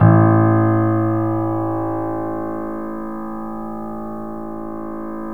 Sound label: A, The Piano; InVision Interactive